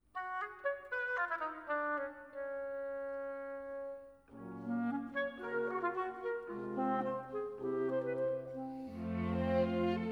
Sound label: instrumental